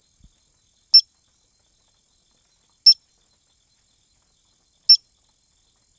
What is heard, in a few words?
Stumpffia nigrorubra